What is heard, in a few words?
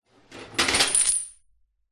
industrial chain large set down on hard floor; zapsplat